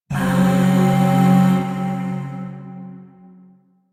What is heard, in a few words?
Shawzin Hirajoshi Chord B; Chords; Warframe; Zariman; Void